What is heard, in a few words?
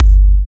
Dark Techno Kick